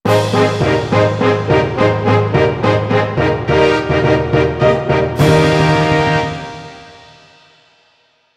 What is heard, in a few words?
Player Fanfare; Pokemon Battle Revolution; wii